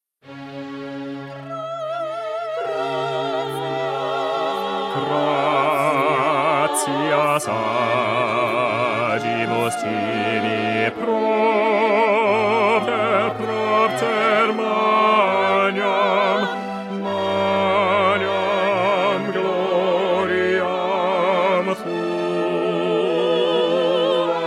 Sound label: bass; mozart mass in c minor; gratias